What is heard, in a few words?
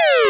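ambulance; VIDEO GAMES; turbo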